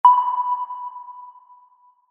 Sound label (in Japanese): ソナー 探信音; 武器効果音